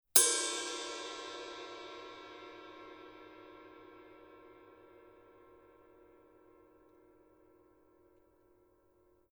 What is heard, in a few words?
color sound 900 20 purple heavy ride body, paiste